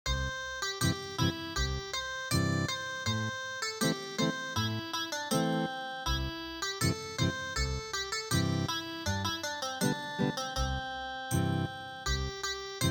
Ethnic Guitar, traditional; The Hill That Is Like a Gold Vase

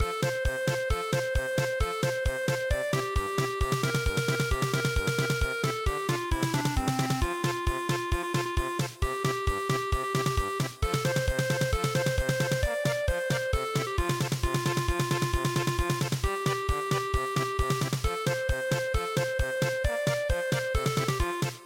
sparrow house polka

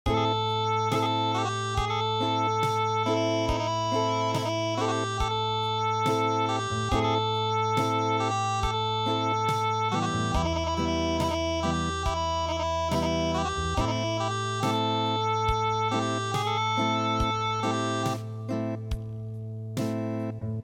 Acoustic

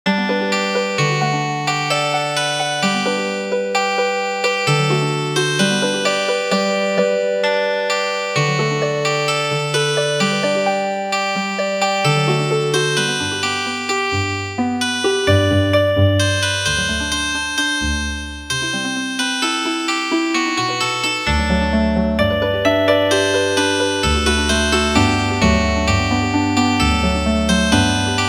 Healing Harp, traditional; Duncan Gray

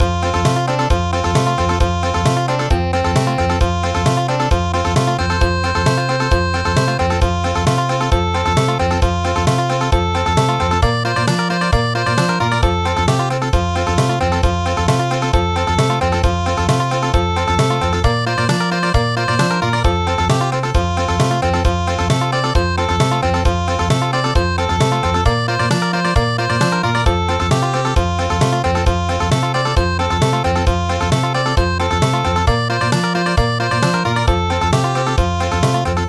Country, midi

